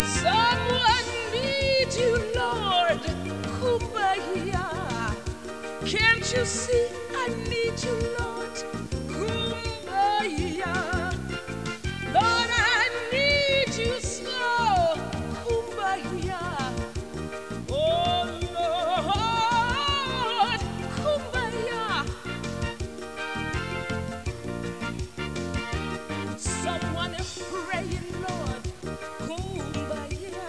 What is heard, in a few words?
kumbayah